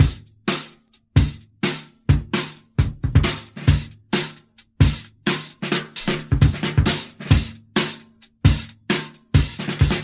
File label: odd kicks, Drum loops, Funky